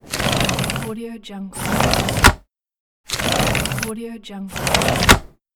sliding window

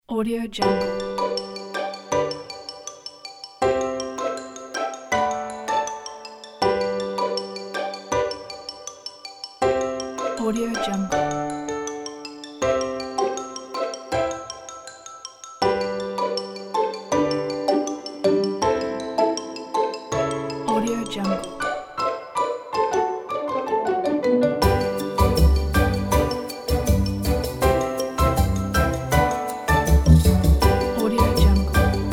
funny stroll; mr b music studio

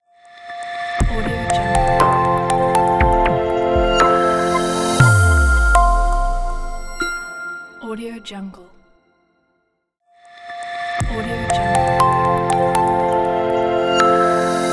modern reveal logo

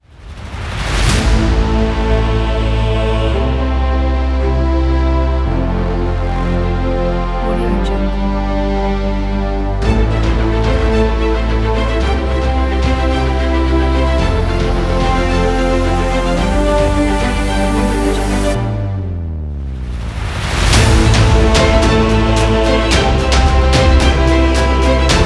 intense action inspiring

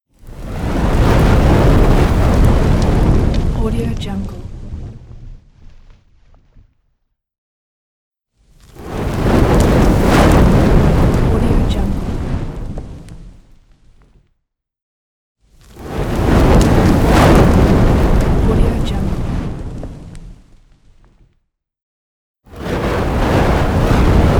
huge fire flames whoosh

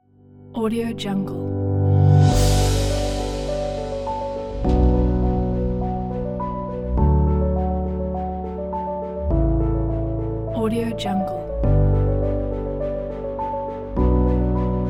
uplifting epic cinematic pack

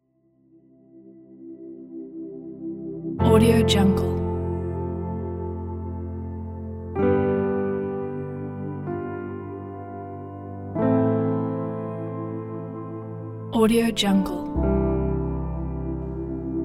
emotional sad orchestra; filmmusicultimate

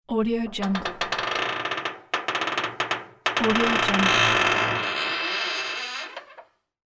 iron creaky door long

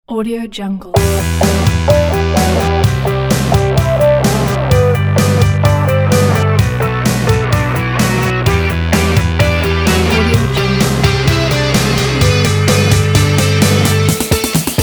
dance club rock; owlstockmusic

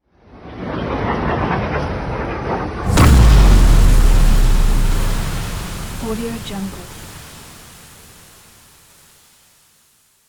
projectile whistle and explosion

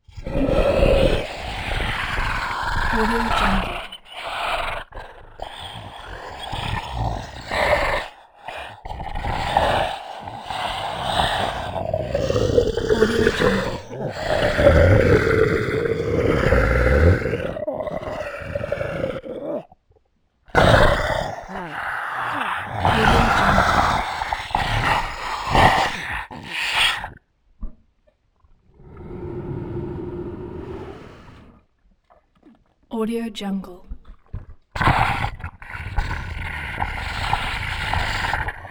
tongue slither slowed gurgly growly wet slimy

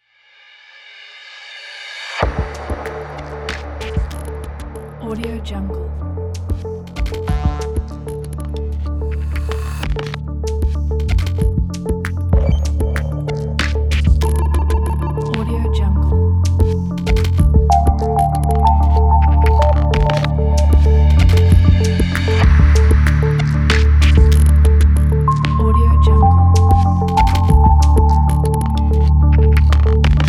soft idm background

